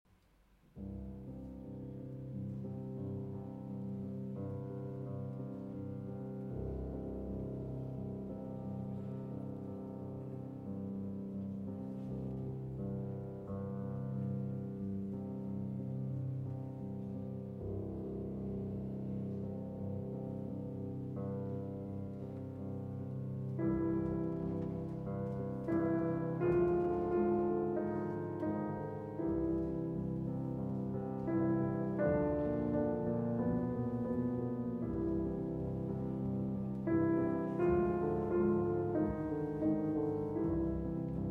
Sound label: Piano Concerto; No. 3 Movement III